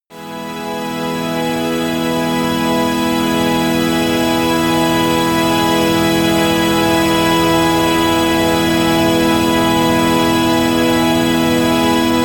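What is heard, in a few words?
cathedral air, pads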